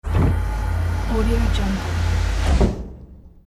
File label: space lab door close longer; hollywoodedge